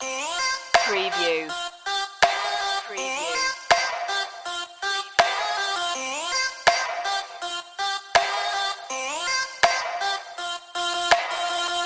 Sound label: trap this loop